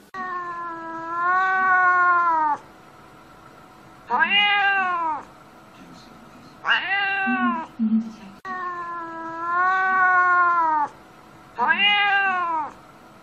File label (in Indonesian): Suara Kucing Marah